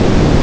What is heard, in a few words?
wave; Nintendo; July 2020 Gigaleak